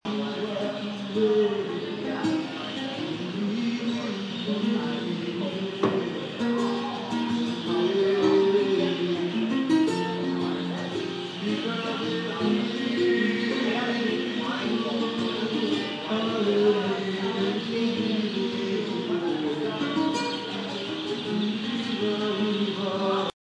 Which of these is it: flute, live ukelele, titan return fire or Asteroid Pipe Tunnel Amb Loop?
live ukelele